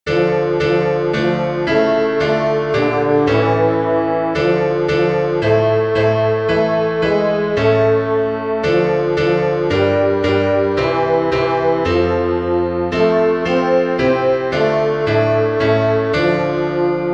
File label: heinlein tenor